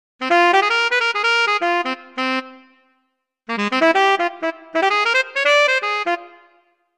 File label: tenor sax; kontakt player; sibelius